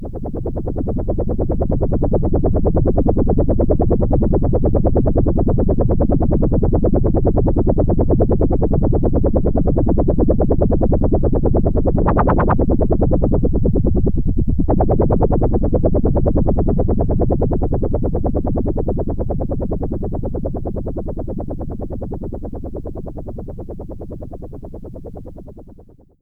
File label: BOAT, Roland SH-101; Factory Patches